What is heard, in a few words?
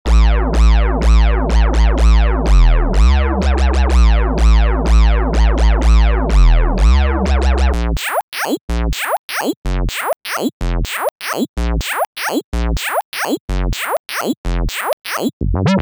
Synth Bass Presets; Alternator